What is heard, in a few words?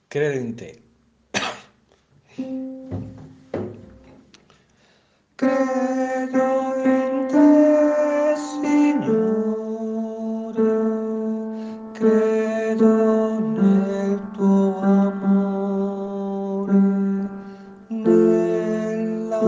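tenor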